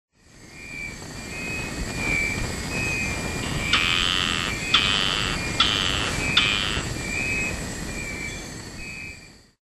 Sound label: Mantidactylus ulcerosus